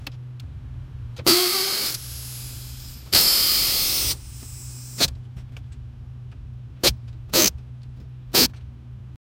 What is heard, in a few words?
a car tire filled with